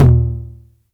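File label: 909 low tom tape; Toms; Goldbaby Tape808 & Tape909; The Tape 909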